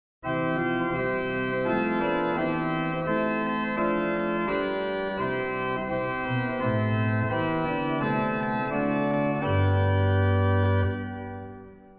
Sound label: Cor De Nuit Flute Plein Jeu; CavColMainz